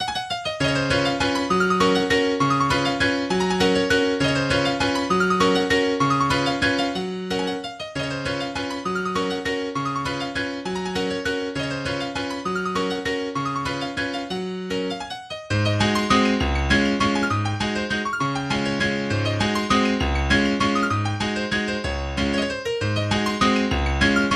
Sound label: Waltz in G Flat Major